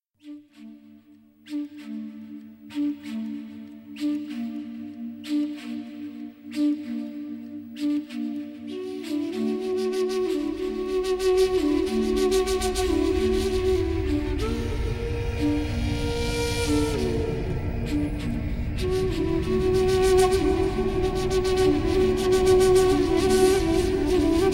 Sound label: Instrumantal Music